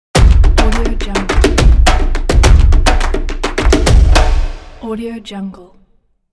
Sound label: energetic percussion logo